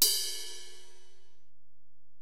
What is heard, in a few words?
Ride, Alesis DM-PRO